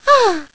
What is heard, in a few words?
Sigh; Zelda sighing